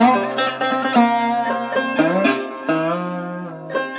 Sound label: plucky sitar dulcimer thing; WORLD LOOPS